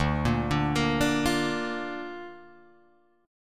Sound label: D Minor Sharp 5th D Guitar Open G